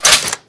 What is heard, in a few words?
sniper bolt